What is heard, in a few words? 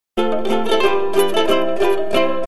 three ukuleles